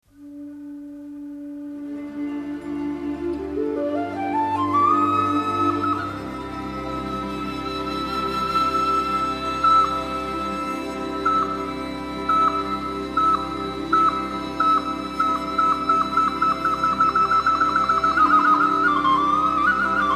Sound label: Melodia